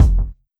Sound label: Kick, Drum Hits; Trance; Zero-G Trance Inducer; 90 s Sample CDs